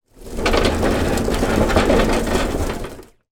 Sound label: lawnmower push roll motor off path